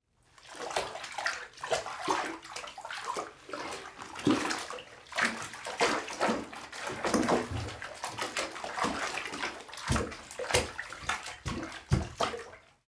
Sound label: splashing mixed